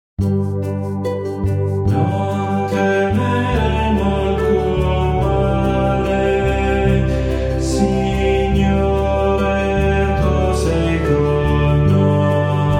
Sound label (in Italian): Non temeremo alcun male Signore tu sei con noi